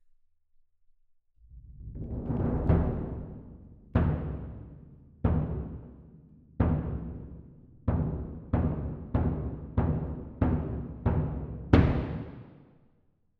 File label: Perc